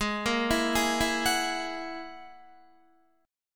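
A flat 7th Suspended 2nd Sharp 5th A flat Guitar, chord; Ab7sus2#5; 16,13,14,13,16,13; arpeggiated; 4E,4A,5D,5G,5C,6F; chordshape; JGuitar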